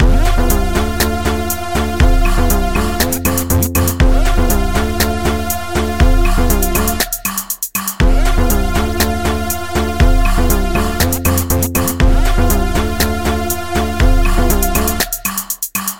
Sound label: Techno Commercial